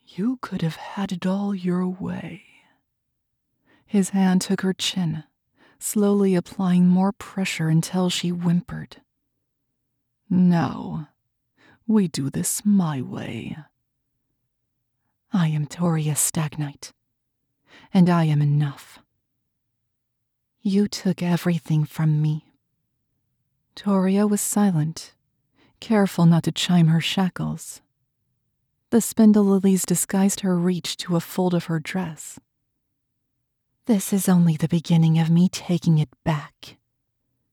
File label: high fantasy action